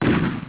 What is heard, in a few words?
shot echo; FPSSample